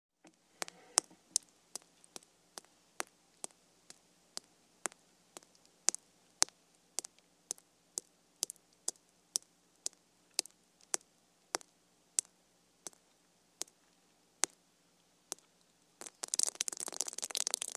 Drips Hard & Thick Fall Sometimes Quickly Onto Hard Sur; water